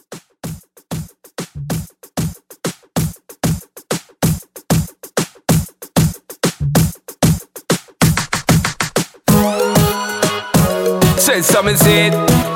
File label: Dancehall Re Drum 95